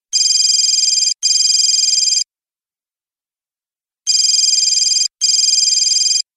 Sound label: Ringer; Burn Notice